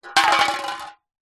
food drink soda can empty knock over concrete ground